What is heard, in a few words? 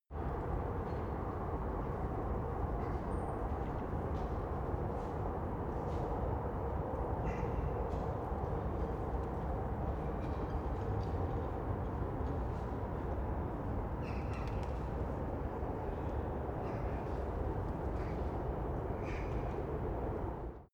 square industrial traffic distant